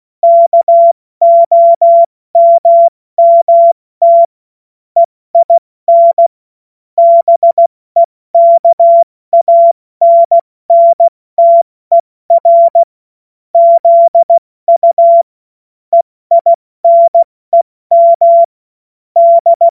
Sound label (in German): Witz, cw morsen; Morsekurs; Witze/Joke